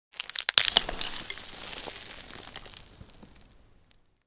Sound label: Dirt and debris fall small